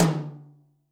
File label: tom, Zoom MRT-3B